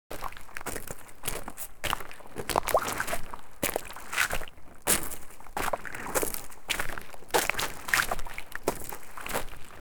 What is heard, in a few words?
footsteps in ankle deep, lapping